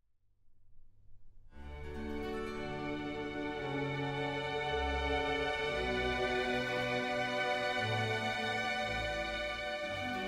Air on a G string; Bach

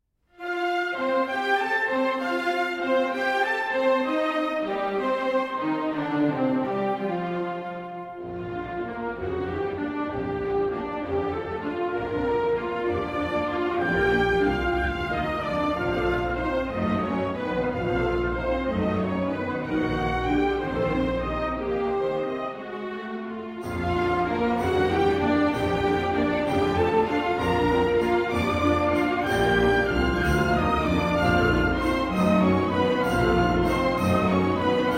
Waltz (Live); Live in Australia, 2016

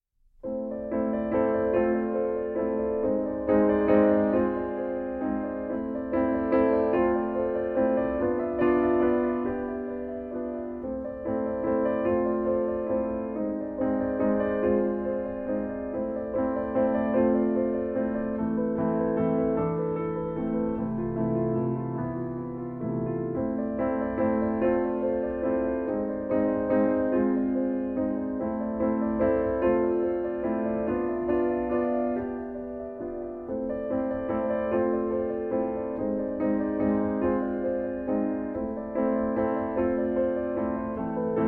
Études For Solo Piano

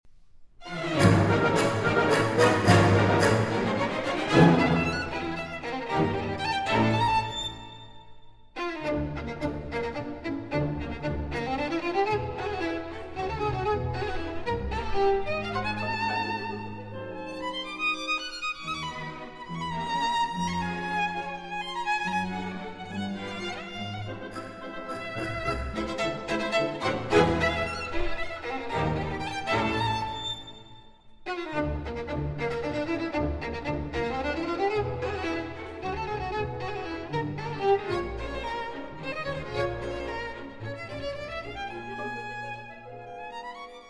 Violin Concerto, Allegro Molto; Violin Concerto No. 1, Op. A-7 Concierto Español III.

